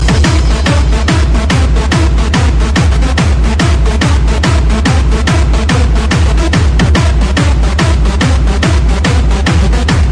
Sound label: Bass